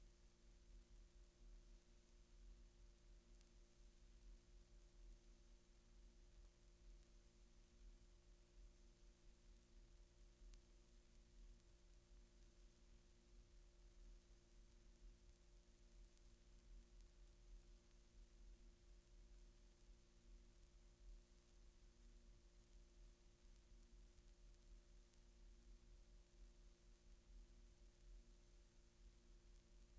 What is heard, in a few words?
Silent Groove, Crosley CR249 Turntable; Ultimate Analogue Test LP